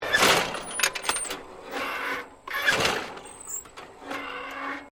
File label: Clay Pidgeon Launcher Automatic Firing, Gun Club sounds; Miscellaneous Sports